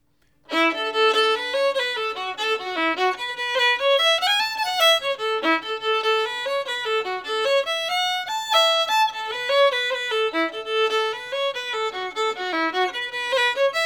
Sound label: The Spotted Dog, fast